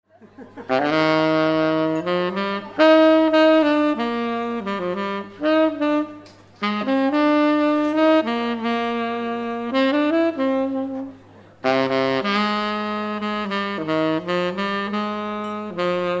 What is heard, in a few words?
Rainbow Solo